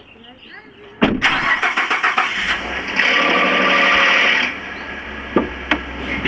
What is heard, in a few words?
f150 noise, noise on start